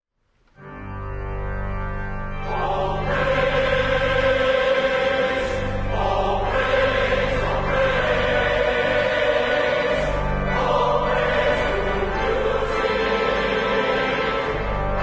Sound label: Fanfare